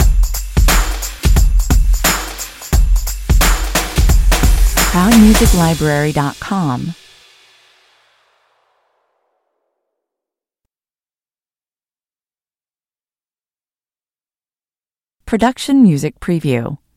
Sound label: stinger